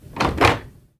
door open; the 39 steps

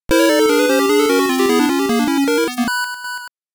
Game Over (SMS Version); Wonder Boy Collection Soundtrack 2023

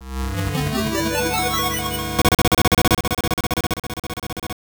Game Over Sound; Baraduke; X68000

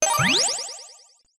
collecting the miracle item; Mystical Ninja Starring Goemon

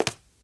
run tile

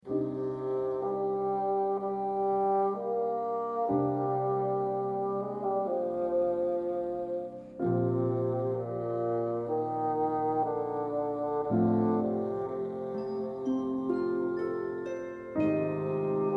Field BGM; Xenoblade Chronicles 3

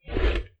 Drawer Box

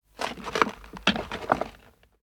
Footsteps debris; The 39 Steps

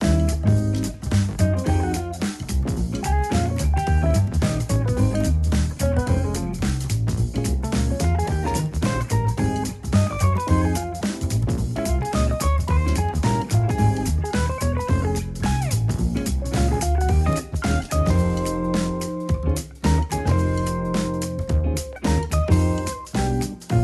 Arcade Jazz; little big planet playstation vita

